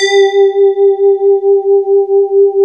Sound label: Yamaha FM; Rubber Chicken Software Sample Bank